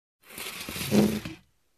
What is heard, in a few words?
Moving furniture creak